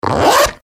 zipper; haus-garten

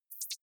hover high, signale

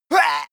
male hit

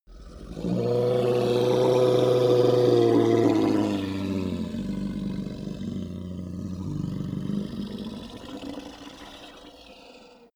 hellhound roar growl weak long, Creatures; kreaturen

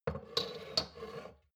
shot glass place table slide soft; berufe; gastronomie; soundpool

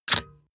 metal clack lock

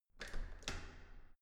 entrance door handle use normal

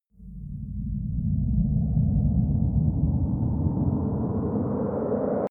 incoming object, risers

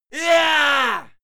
male fight scream yah; schreie; menschen; soundpool

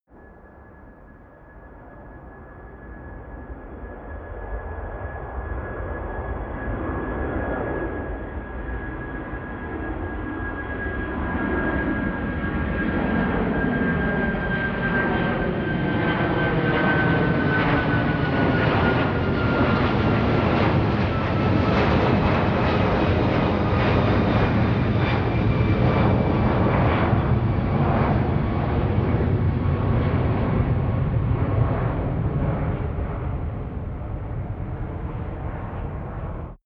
plane flyby land tonal

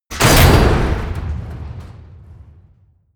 smash, baugewerbe

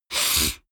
nose sniff male hard; koerpergeraeusche; menschen; soundpool

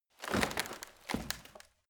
rotten wood handle; foerster

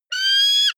offspring eagle call, voegel; natur-tiere

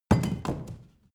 drinking glass put down rattle; gastronomie; berufe

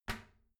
toilet seat lid close soft; haus-garten

